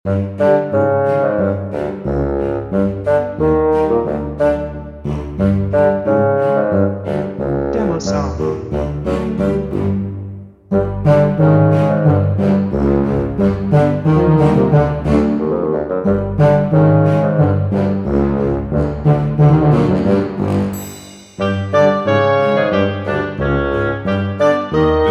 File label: Tuba Trombone Loop